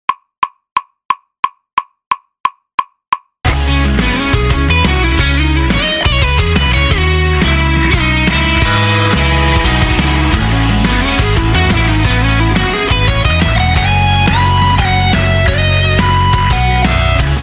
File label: Intervals Riff, guitar